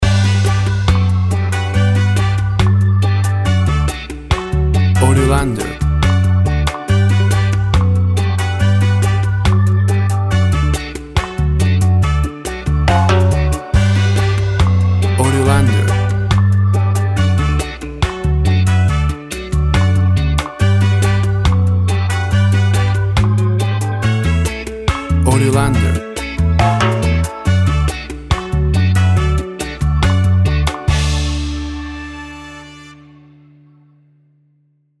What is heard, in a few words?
Virgin Islands Reggae Music